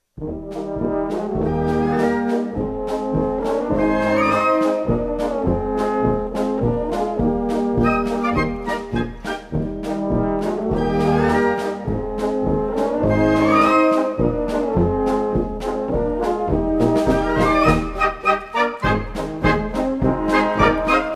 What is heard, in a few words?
polka